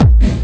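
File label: hard bass drums; breakcore; Bazzdrumz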